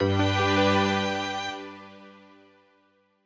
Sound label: Retry Jingle